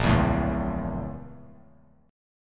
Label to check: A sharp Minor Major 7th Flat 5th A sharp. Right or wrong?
Right